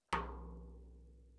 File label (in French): Surdo frappe du cercle